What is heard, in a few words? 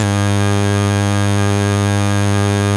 SYN DIG, Roland, digital; Sound & Vision - Gigapack I CD 2